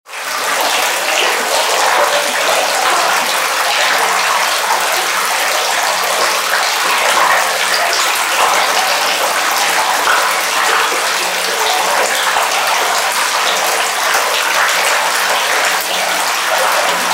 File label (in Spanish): agua